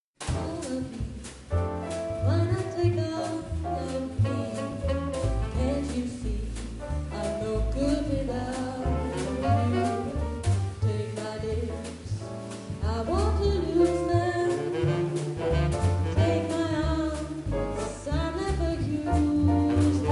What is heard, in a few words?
Swing